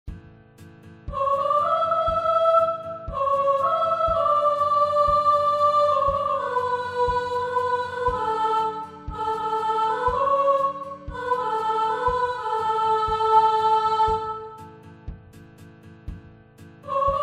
Chorus Tune

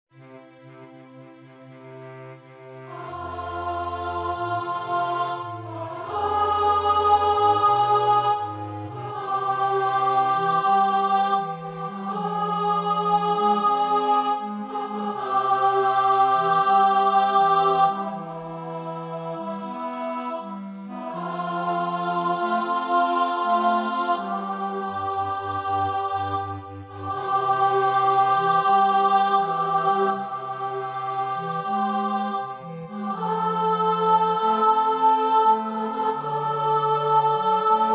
Alto

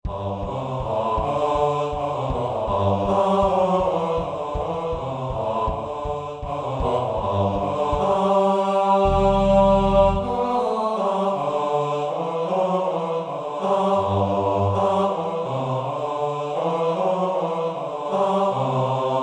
Bass

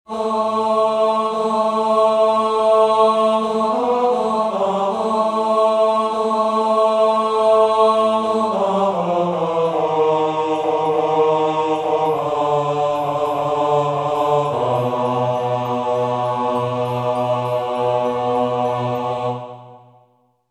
Tenor

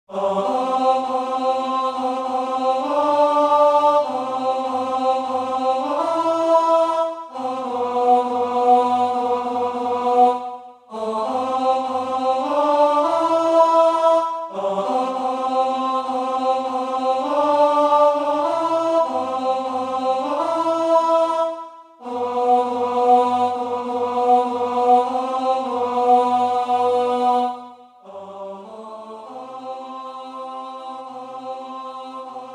Tenor

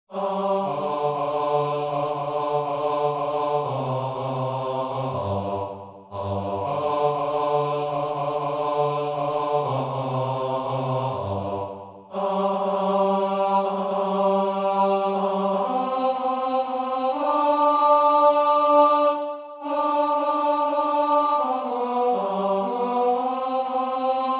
Tenor

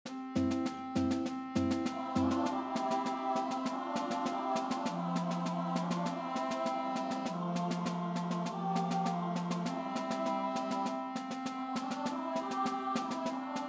Carved in Stone Tenor

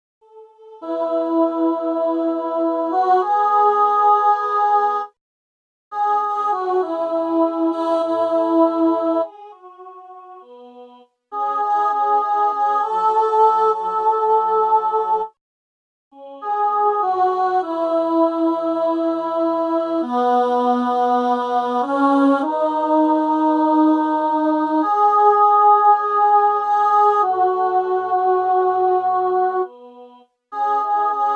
Long Bay Alto

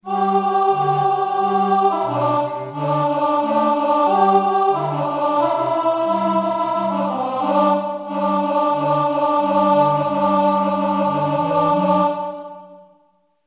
choir Tenor

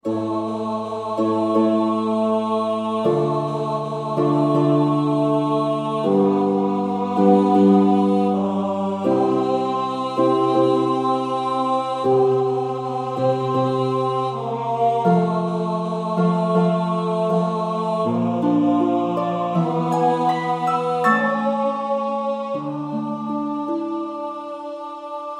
Tenor

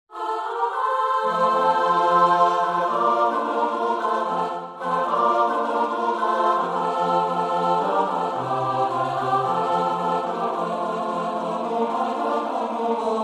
All from Chorus

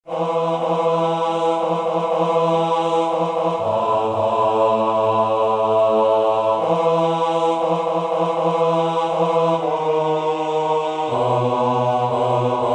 Roll you sweet rain Bass

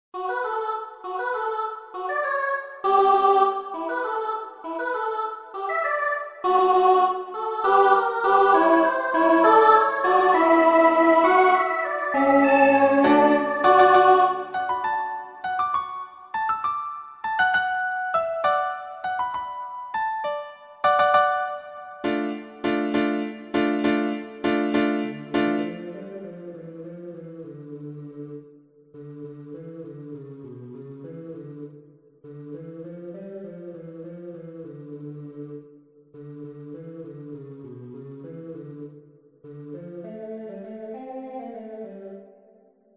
Alto

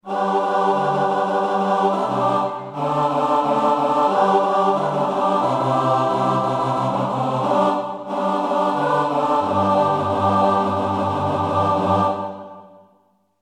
choir All

